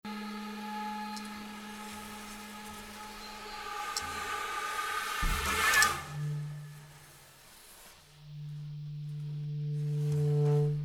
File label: Interludio Instrumental